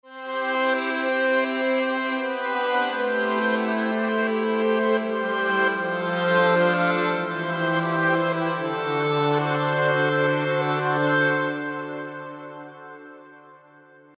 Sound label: Voices Dist; Arturia Augmented Voices; TUBE CULTURE; Bring The Crisps Mastering